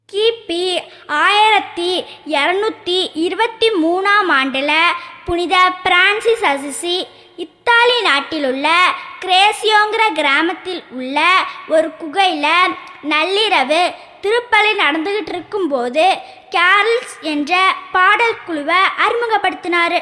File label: Christmas chorale; xmas